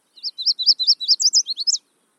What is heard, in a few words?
warbler; bird sound